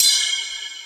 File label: Ride, Dubstep One-Shot Drums